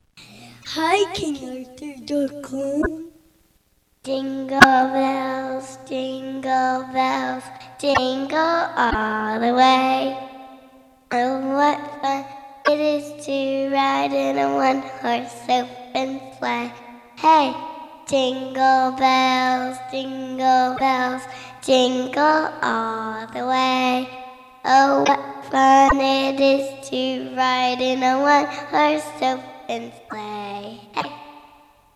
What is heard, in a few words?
Jingle Bells by a Little Girl; Christmas Music